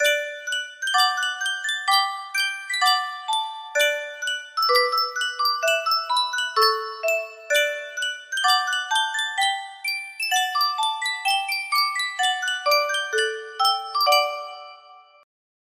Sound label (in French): sankyo boite a musique; le gendarme de saint-tropez